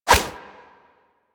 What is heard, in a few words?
Punch Whoosh; Warframe; Weapons; Infested; Inf Kick Punch